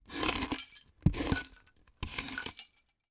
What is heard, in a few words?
sweeping glass debris